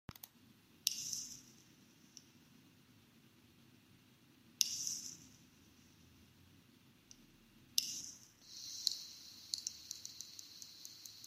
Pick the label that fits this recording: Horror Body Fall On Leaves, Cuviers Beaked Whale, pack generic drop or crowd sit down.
Cuviers Beaked Whale